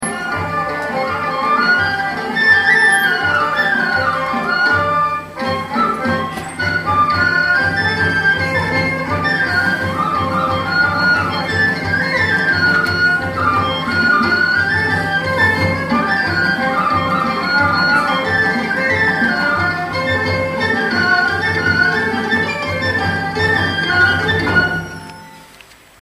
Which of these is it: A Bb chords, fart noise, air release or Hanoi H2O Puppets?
Hanoi H2O Puppets